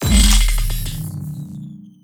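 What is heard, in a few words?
mech crossbow end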